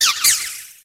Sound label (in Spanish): Grito de Cutiefly